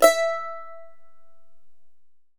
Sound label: CHARANGO E3; The Andes